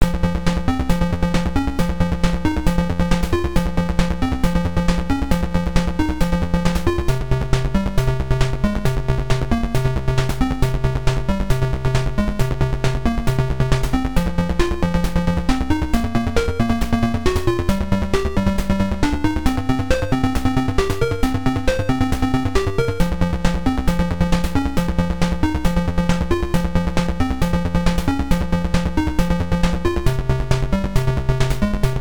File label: Demon Rolick [PSG]; Golvellius; MSX